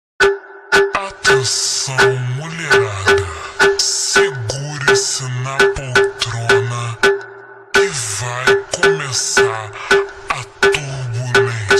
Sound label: Automotivo slowed